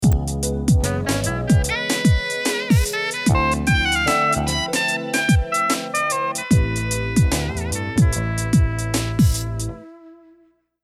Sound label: Jazz